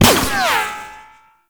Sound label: Shield Impact; Tank; Warframe; Lasrian Tank